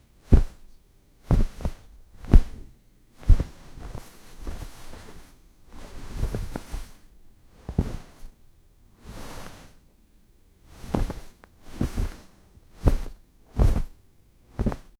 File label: cloth slight woosh; brushing